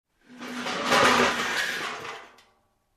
Scrap Metal rattling

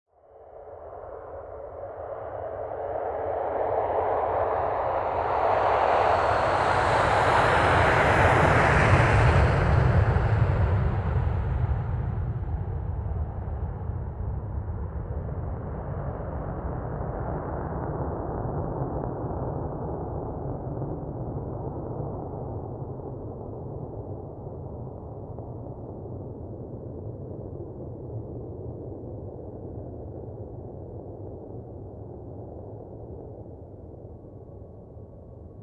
Aircraft Lifting Off Passed By

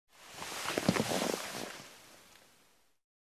Long and soft cloth creak

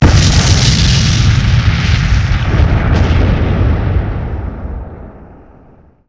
Bomb explosion up-close

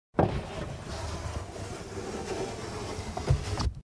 Lifting Car window

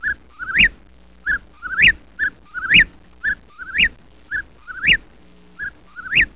Sparrow chirping

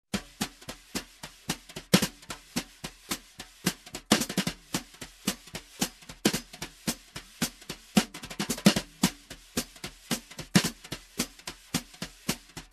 Fast brushed jazz drums

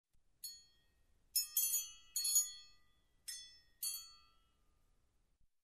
Short Clinky Bells

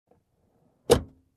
Closing compartment

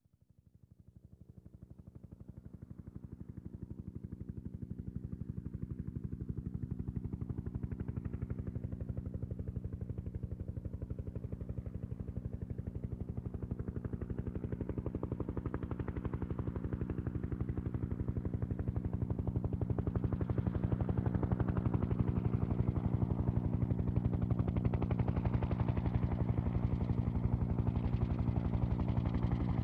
Big Helicopter approaching and landing

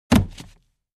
Pile of boxes being arranged

